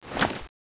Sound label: draw; cso; x-12